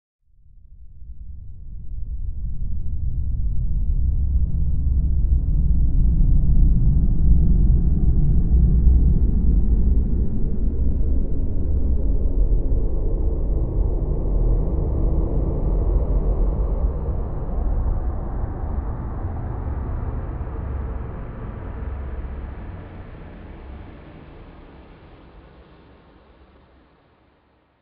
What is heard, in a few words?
Space Ship Lift Off; spaceship fx